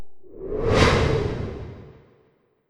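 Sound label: Elementalist Skill swoosh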